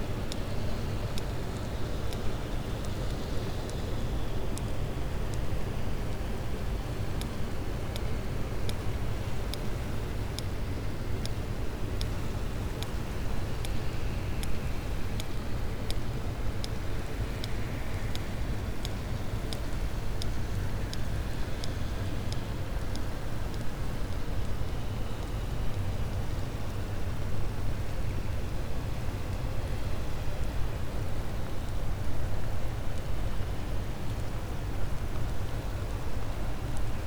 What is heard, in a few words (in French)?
cachalot positif, Physeter Macrocephalus